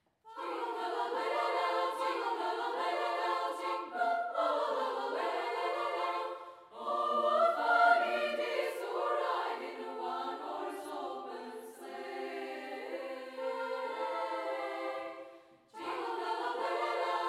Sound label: jingle bells